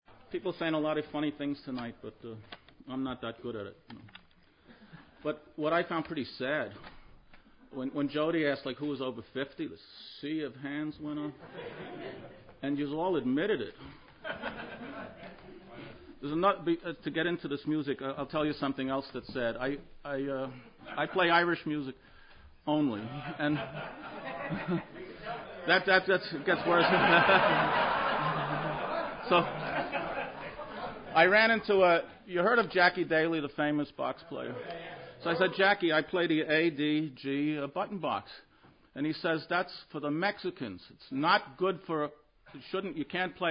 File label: Irish barn Dances Lead In; 2008 Concert